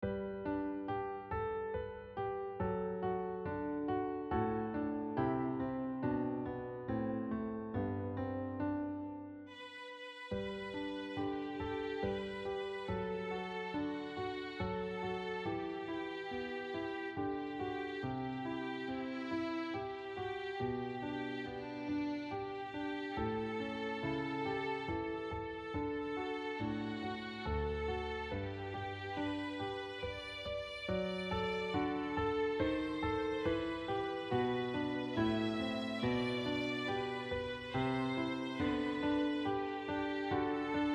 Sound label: Halalela piano and violin